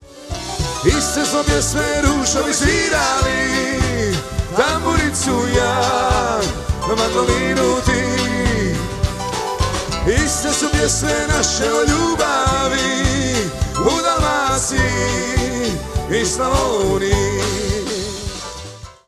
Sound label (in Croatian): Tamburica i mandolina; Hrvatski MIX